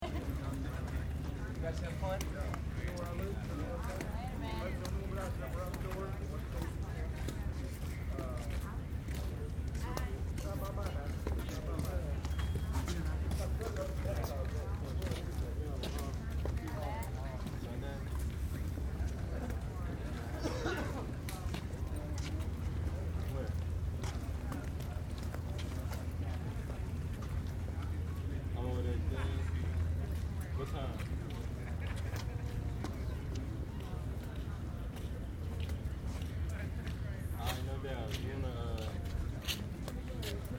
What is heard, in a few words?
College Campus Ambience Short, Student crowd walla; Student crowd walla, mid 20s, male and female voices chatter. Very distant city rumble.; School Sound Effects